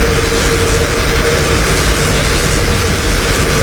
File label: decrepify loop; LoL; swain